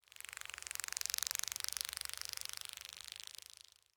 Forest Open Glade Grass Hopper Fly By, Day

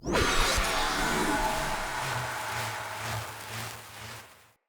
Energy Blade Projectile By; Weapons; Swords

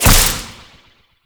crack impact; Warframe; Khora

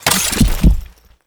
Sniper Aim In; Warframe; Frumentarius; Exalted Sniper

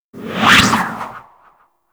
Grapple Pull; Warframe; Berserker

